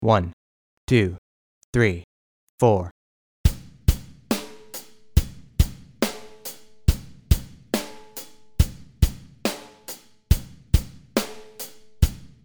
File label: Basic Groove